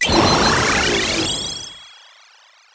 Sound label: item speedup; SpaceTrain